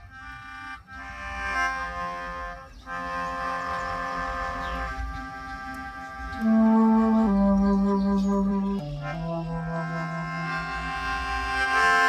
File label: Floating